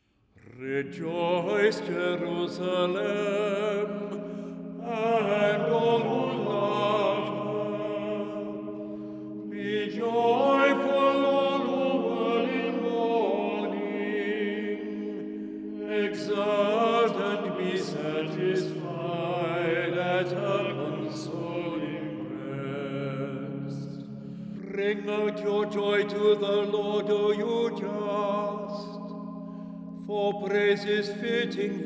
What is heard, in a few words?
Mode 5 Lent English